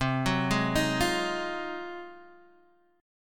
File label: G sharp 13th C